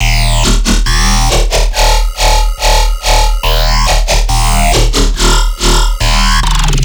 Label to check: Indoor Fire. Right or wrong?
Wrong